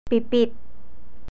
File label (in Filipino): pipit, animals; PIPIT (sparrow)